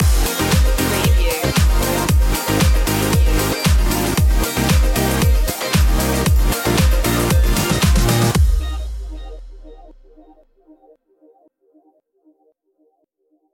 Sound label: start up groovin